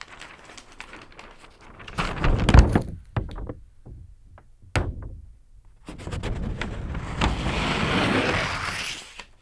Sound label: PAPER; InVision Interactive; The Piano